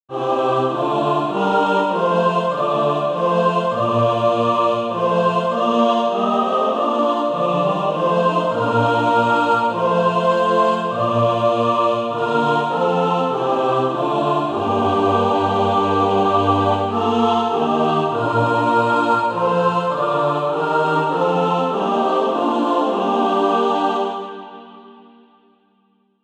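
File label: mixed, Practise Music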